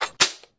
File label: machine gun reload